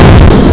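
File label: small fire; AngelTearsv3; AndromedaSky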